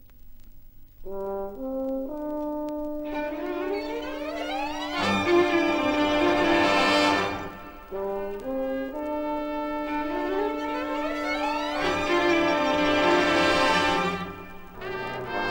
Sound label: Fanfare